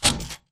bt footstep jog parts; Titanfall 2

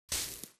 bullet light ballistic sand debris; Titanfall 2

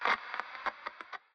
static; Titanfall 2

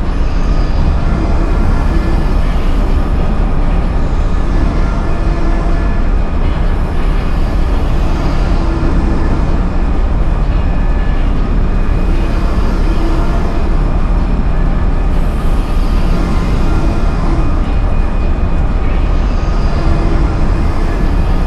mechanical rotator; Titanfall 2

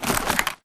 hack spectre armorrattle; dataknife; Titanfall 2

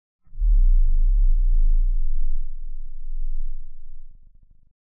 Giant Floor Panel Assemble Final Lift LFE; Boomtown; Sideways Town; Titanfall 2

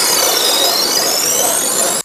hack spectre reprogram tones; dataknife; Titanfall 2

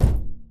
Footstep Jog Far; Titanfall 2

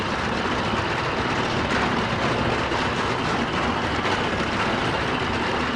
Prop Warehouse Prop Rack Move Mid; Titanfall 2; Boomtown

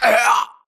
grunt death neck; Titanfall 2

